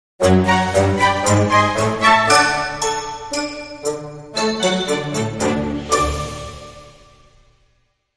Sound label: Dramedy Sting